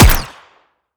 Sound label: Sciweap Shot Small Gun Slider Dart; Robobeat